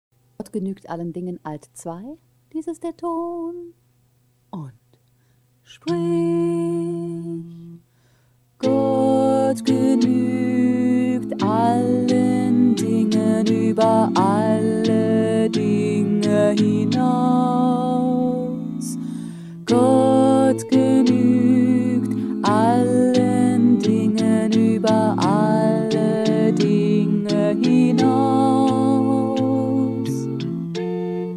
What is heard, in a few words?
alto, choral music